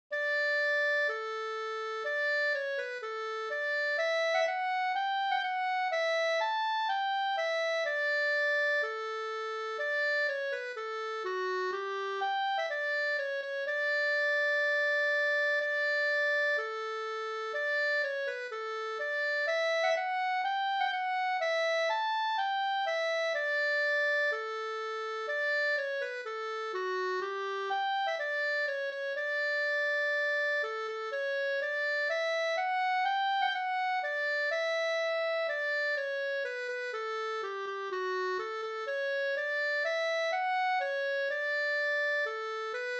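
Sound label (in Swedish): brudmarsch Kyrkmarsch fr Norsjo Västerbotten; Hilma Burvall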